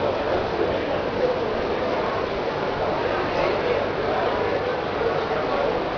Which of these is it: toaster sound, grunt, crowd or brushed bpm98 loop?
crowd